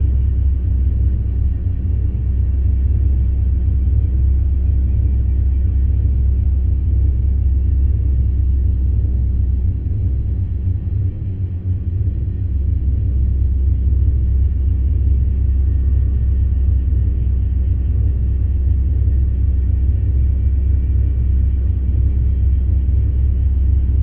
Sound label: starbase hum layer; apocalypse